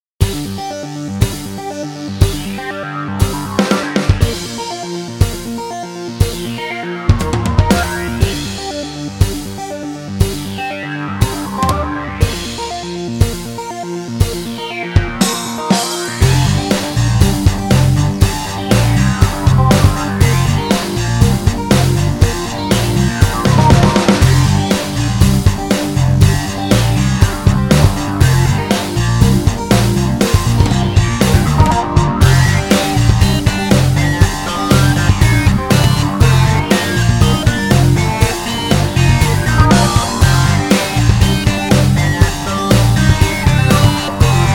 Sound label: Trance Hard Stuff; it still has a heavy feeling to it, and some raw Slayer2 guitars